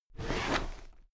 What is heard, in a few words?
drawer cabinet paper open heavy halfway